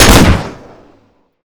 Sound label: shotgun fire; cryoffear; weapons